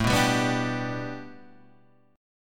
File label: A 7th Suspended 2nd Suspended 4th Guitar Standard